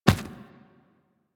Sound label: Infested Medium Run Default, footsteps; Warframe